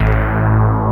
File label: bass techno